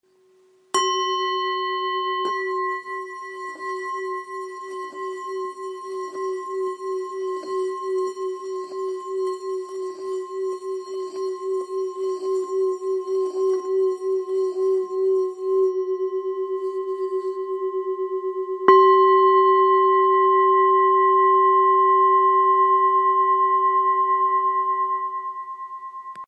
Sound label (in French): Bol bengale fa diese